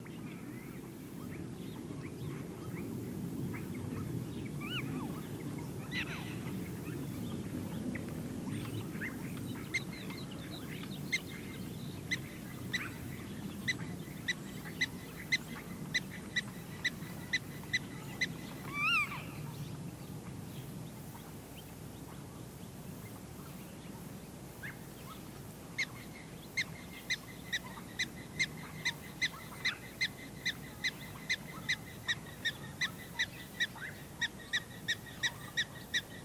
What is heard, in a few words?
Prairie Dogs